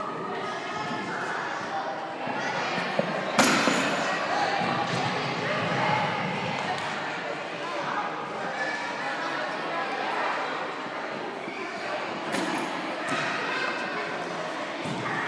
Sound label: the sound of gymnastics